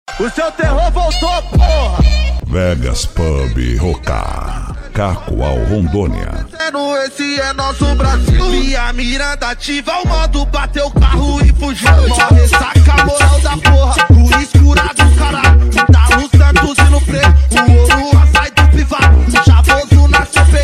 funk mega bass

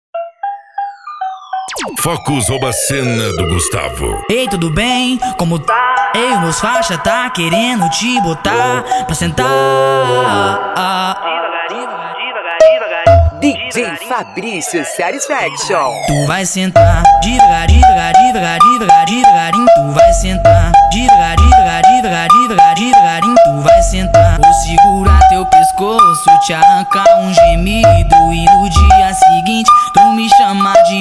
trap e funk

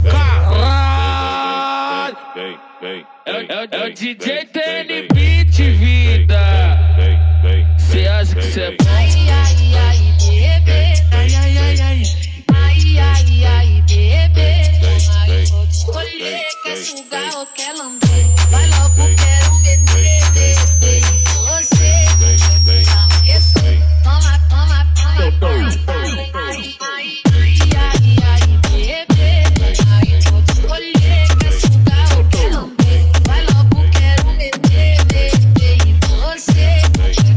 funk bass

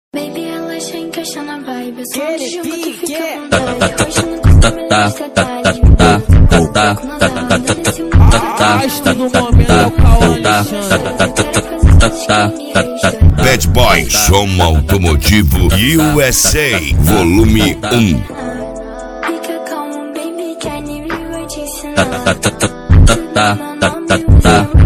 especial funk; cd bad boy som automotivo usa

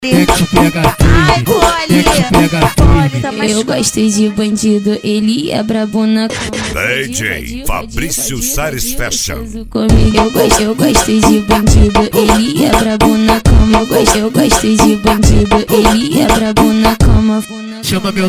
funk

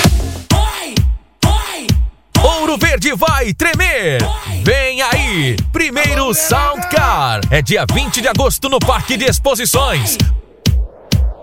sound car

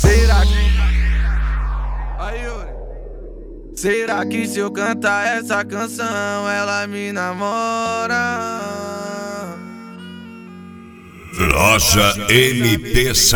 sound car; mt sound car

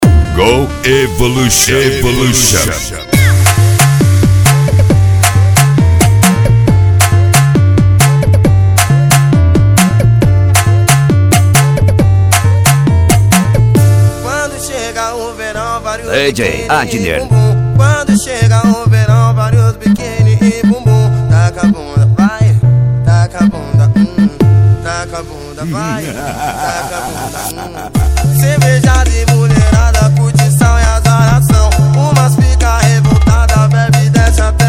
funk tumdumdum funk bass

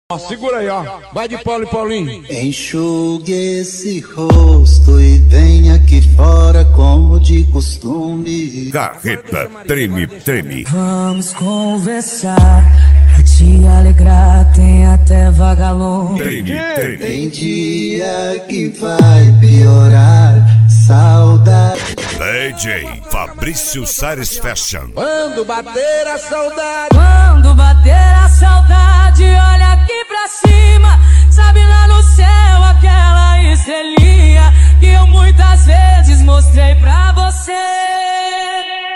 funk mega bass

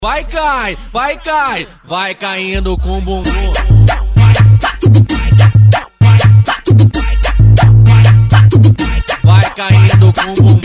funk bass; impacto som

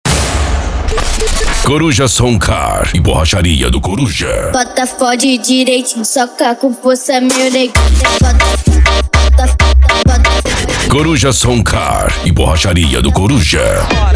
funk; coruja som car e borracharia do coruja

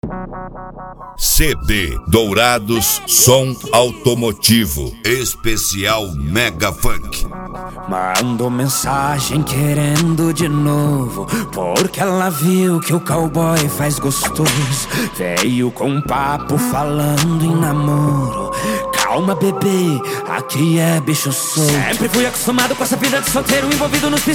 mega funk; dourados

